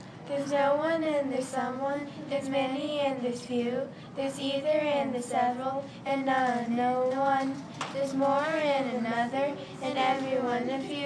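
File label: indefinite pronoun song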